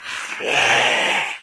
zombie agg

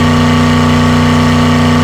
med, newCar

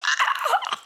Female zombie; Zomboid 41.78.16; Project Zomboid

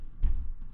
footstep upstairs; Zomboid 41.78.16; Project Zomboid

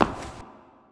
foot tile echo; Zomboid 41.78.16; Project Zomboid

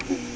drive startup A500; uae

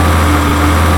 van med

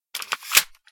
9mm Clip Load; Project Zomboid

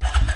start Generator Fail; Zomboid 41.78.16; Project Zomboid

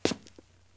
zombie footstep; Zomboid 41.78.16; Project Zomboid

